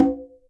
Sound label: Conga, Percussion, Yamaha DTXpress